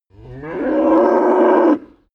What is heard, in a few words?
cow moo single